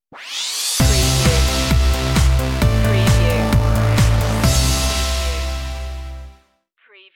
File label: dreamwave stinger